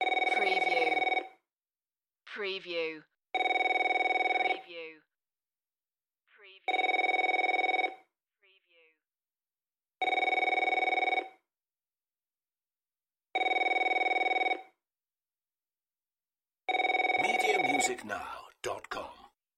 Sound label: Telephone Ring Office; Sounds of ringing telephones, cell phones, mobiles ringing.